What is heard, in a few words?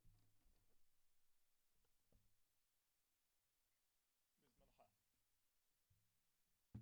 SNR Top